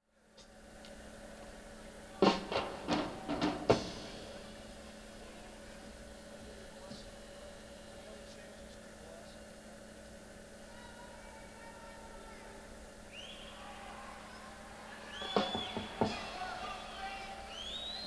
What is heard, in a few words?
Tuning